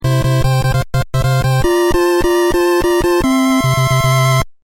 Punch-out Alert Tone

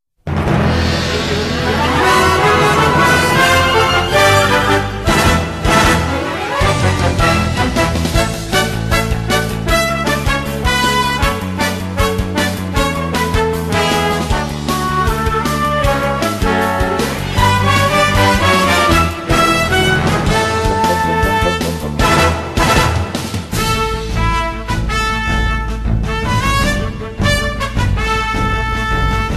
Orchestra

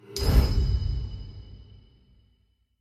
coin; john wick

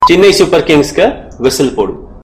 Sms Tone

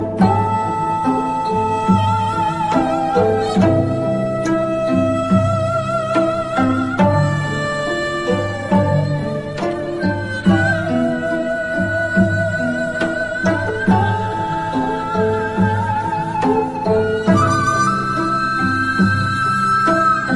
Gayageum

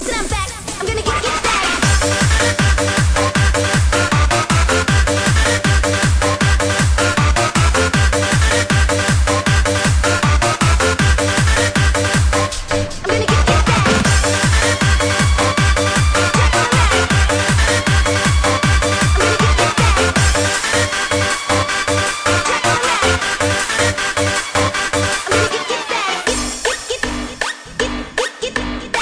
Bounce

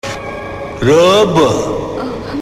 Robo

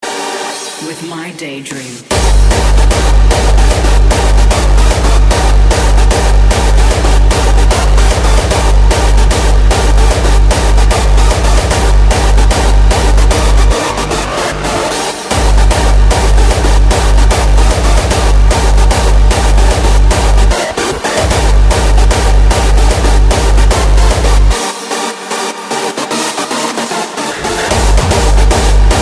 High Voltage

